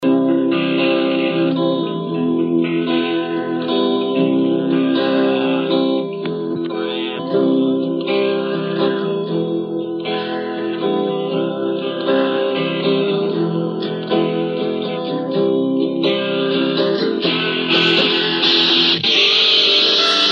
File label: electric